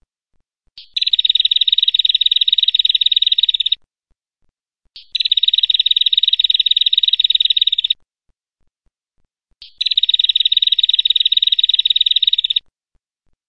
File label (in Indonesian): prenjak cewe